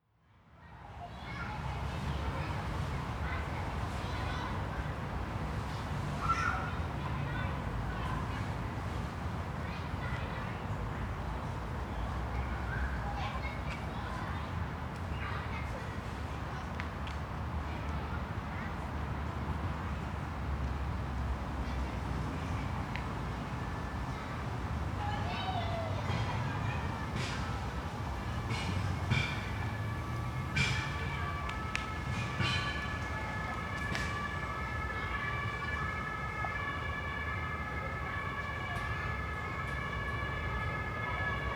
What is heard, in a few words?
park children traffic siren